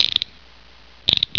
police handcuffs

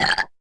berp, cartoon

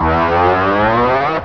stretch, cartoon

